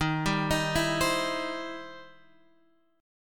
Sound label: E Minor Major 7th Sharp 5th, D sharp